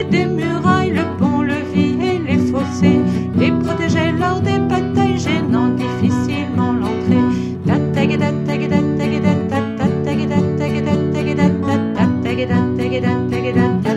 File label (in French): chant